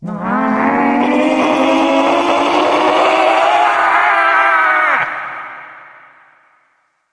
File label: zombies yell; tf; noisemakers